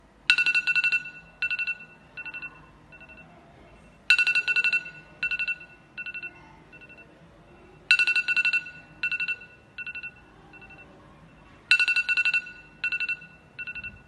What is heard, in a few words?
iPhone Alarm Tone; ringtones